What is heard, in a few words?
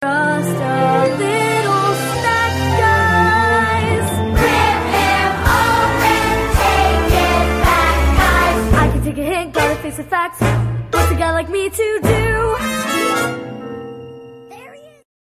Guide Vocal; aladdinkids